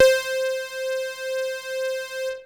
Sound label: Techno leads, hits and riffs